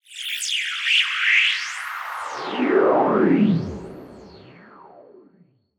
sound design transition sweep high frequency digital